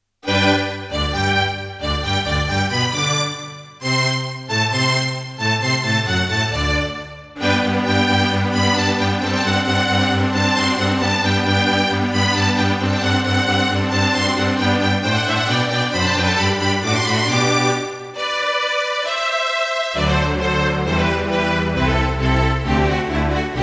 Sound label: Mozart - Eine Kleine Nachtmusik partial, Boss Dr. Synth DS-330; classical